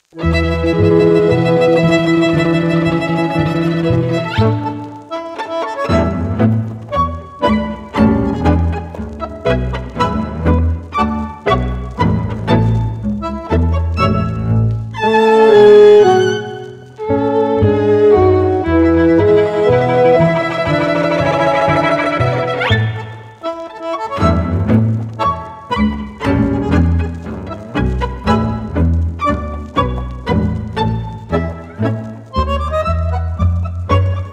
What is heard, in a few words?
tango Music aged